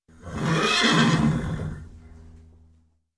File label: Horse Whinny